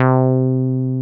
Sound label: 303 basic C4; Drums In Your FACE!